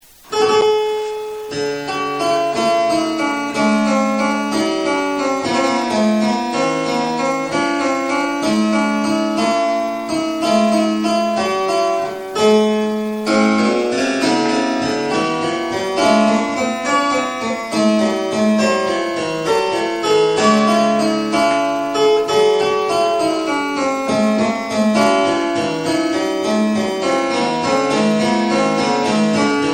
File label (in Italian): clavicembalo